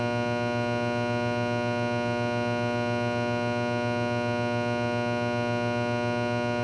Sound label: LIGHT HUM, ROOM NOISE; 1000fx